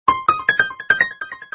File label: piano